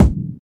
sharp kickdrum sound e key, bass drum single hit tuned to the E note; kick drum samples